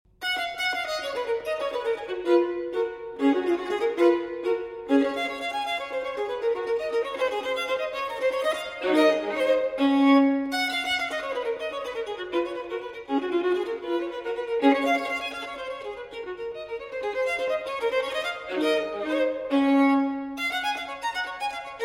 Solo Violin in G Major Allegro; TWV 4015; Telemann Fantasia

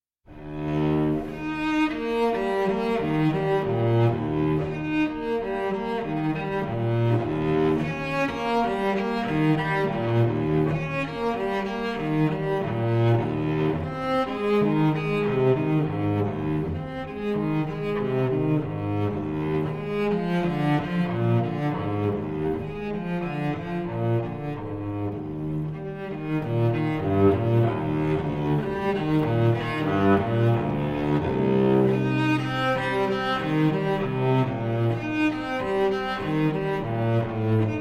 Cello Suite in E-Flat Major Prélude; Cello Suite No. 4 in E-Flat Major, BWV 1010

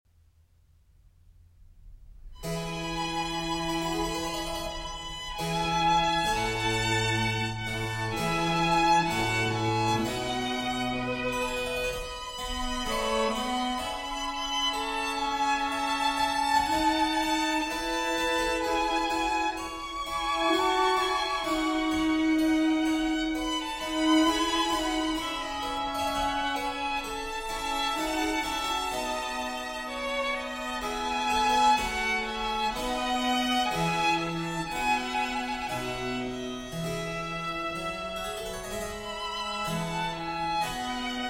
Trio Sonata in E for 2 Violins and Continuo

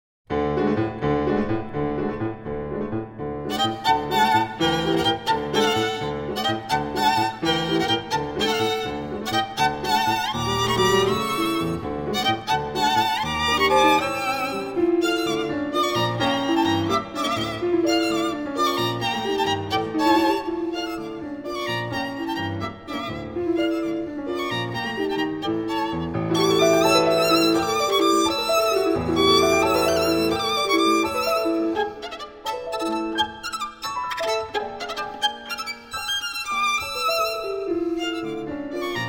Dance, Violin; Vagharshapat

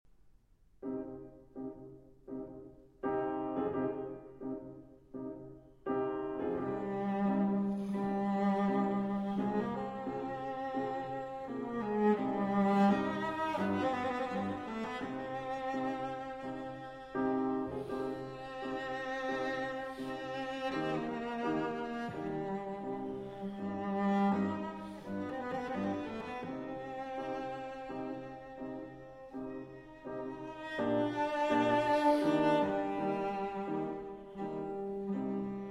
Piano Trio in E-Flat Major, Andante Con Moto

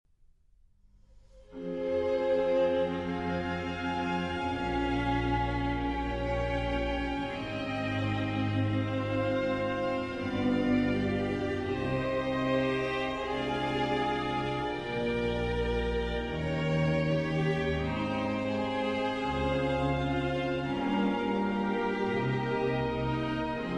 Concerto for Strings and Continuo in F minor

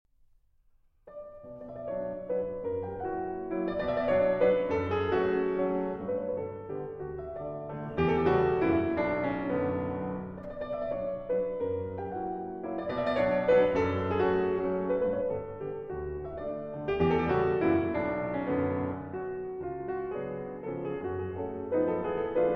Mazurka In B Minor; Chopin; Mazurka No. 19 In B Minor, Op. 30 No. 2

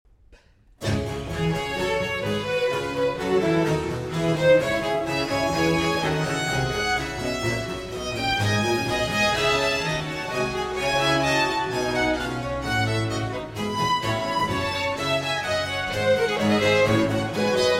Concerto for Strings and Continuo in D minor

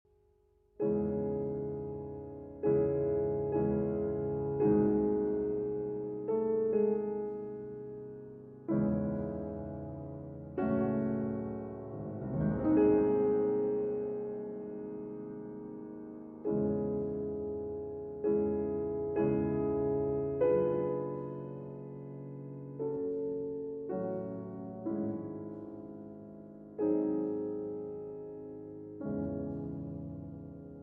Fantasie in C Major Adagio; Op. 15, D. 760 Wanderer

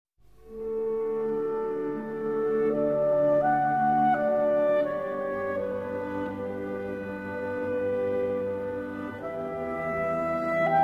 Clarinet Quintet in A Major, II. Larghetto

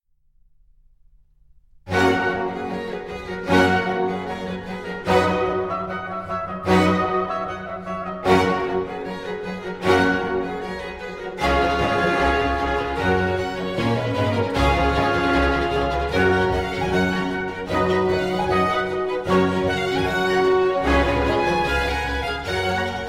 Sinfonia in G Major, Allegro; Altner G4

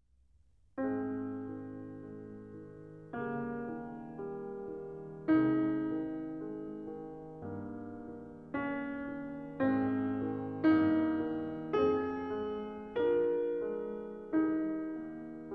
Piano Sonata in C Minor; Piano Sonata No. 8 in C Minor, Op. 13; Beethoven

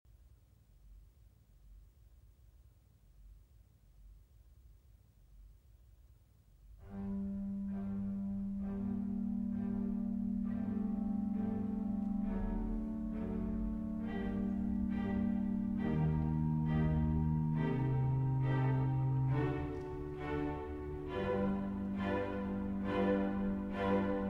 Concerto for 2 Violins and Cello

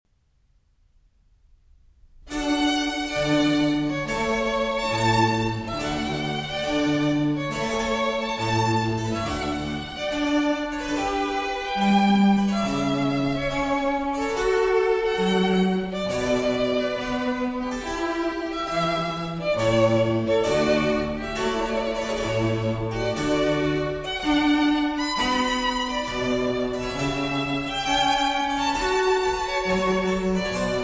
Trio Sonata in D for 2 Violins and Continuo

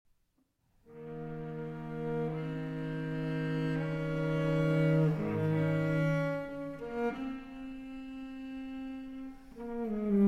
Cello Suite in E-Flat Major, Sarabande; Cello Suite No. 4; BWV 1010 IV.

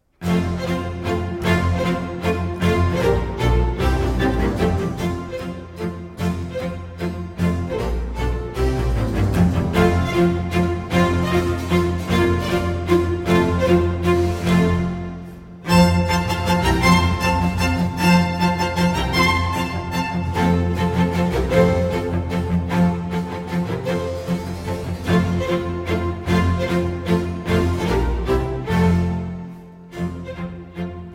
Violin Concerto in F Major, Allegro; RV 293, “L'autumno” III.

